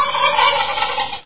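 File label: turkey, many, distant, in space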